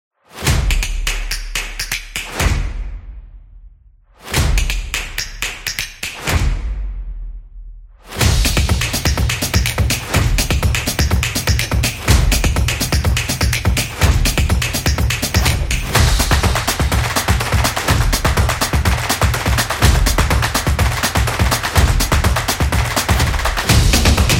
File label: This Is Clap Upbeat; football promo